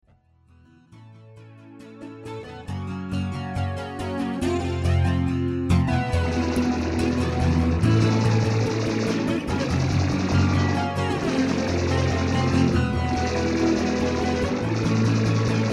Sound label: Instrumental